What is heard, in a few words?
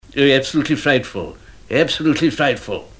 fright